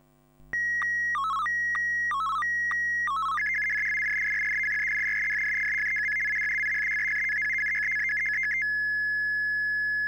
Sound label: ATV125BW QRM Narrow, SSTV Signals; hamradio; AVT